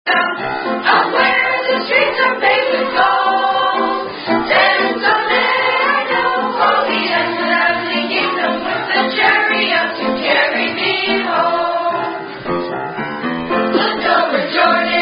Swing Low Sweet Chariot With Gestures (Children Singing)